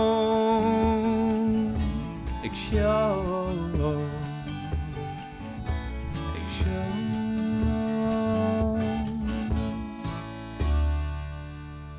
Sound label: noisy, vocal mix